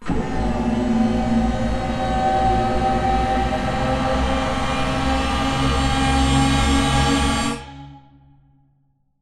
Plunger Rise Rise; Titanfall 2; Boomtown

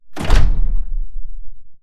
footstep generic; Titanfall 2

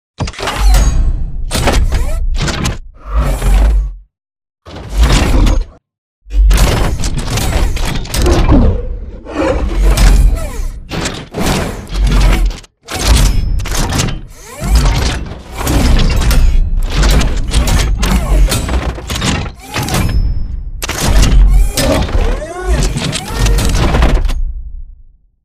body grabbed; Titanfall 2

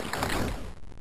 bullet generic titan shield scatter; Titanfall 2

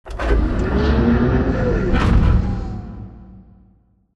Robot Arm Angle Up Close; Boomtown; Titanfall 2

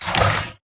Mvmt Servo Light Short; AI Stalker; Titanfall 2

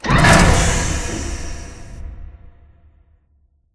Robot Arm Track Speed Change Close; Boomtown; Titanfall 2

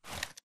putaway; Titanfall 2

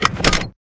Metal Jog; Titanfall 2

